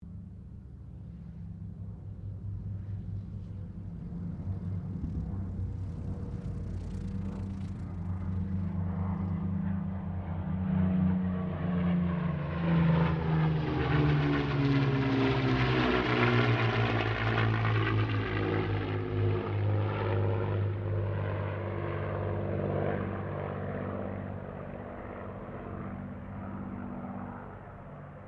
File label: AIRCRAFT MUSTANG KITTYHAWK PASS; Mixed aircraft squadrons; Airplane; Airplanes; Vehicles